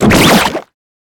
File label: sword kill